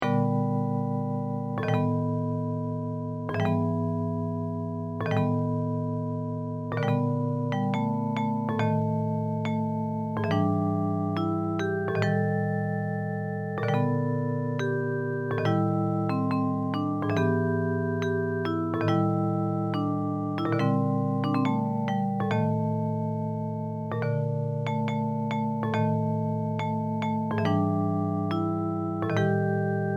folk song of kyoto; kaikaki bushi

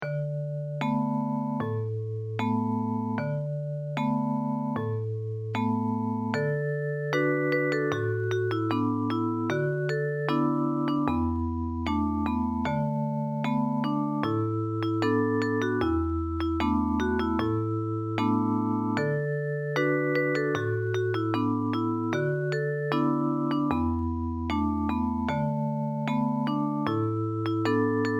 japanese folk song; fune wa atarashi

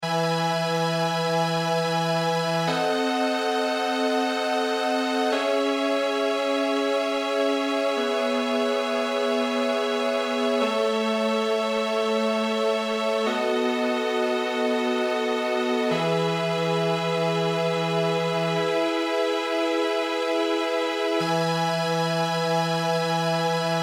gentle breeze string quartet

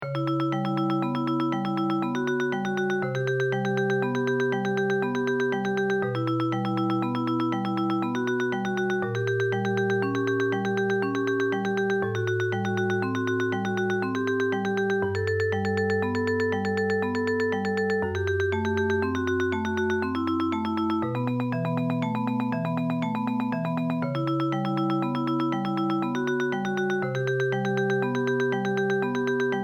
tremolo improvisation

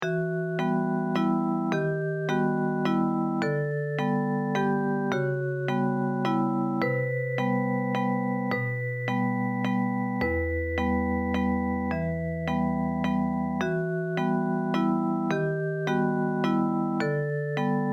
simple waltz

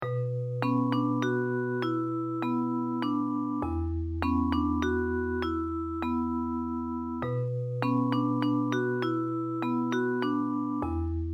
short melodies twilight melody

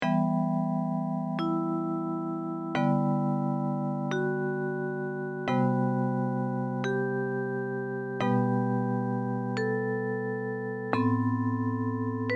short melodies too short melody

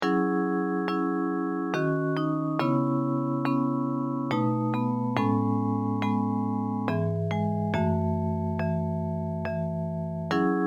elegant waltz

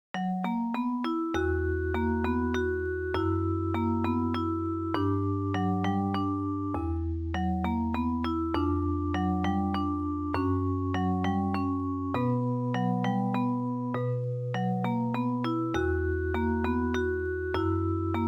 short melodies engagement melody

